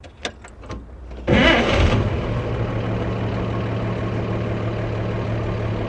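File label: Chevy Truck 46 Start Idle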